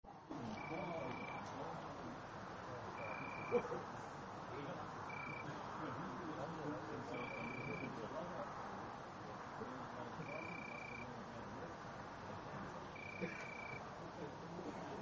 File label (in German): Weinhahn, Oecanthus pellucens; Weinhähnchen